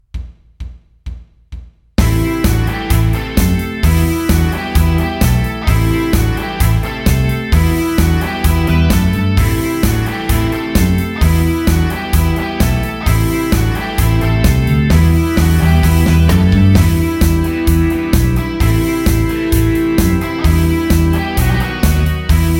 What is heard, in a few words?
Em Riff Rock Straight 130